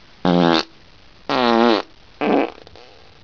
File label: fart; a very blunt and loud 3-toned fart...listen to see what happens on the 3rd note.